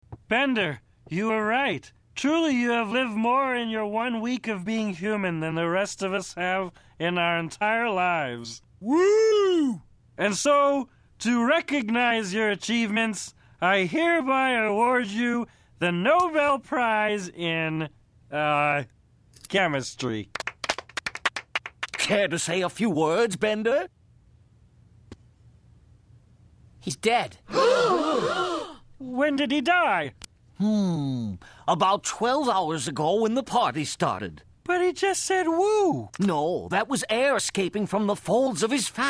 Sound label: woo